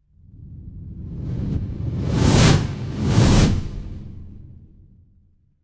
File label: fire electric whoosh flame